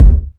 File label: natural bass drum sample g sharp key; Mono